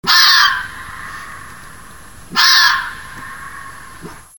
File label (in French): renard cri; mammiferes; parcours santé